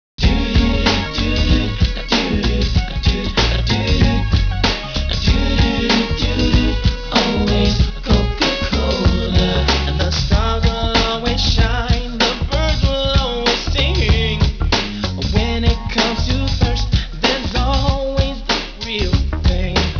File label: hip hop; coke hip hop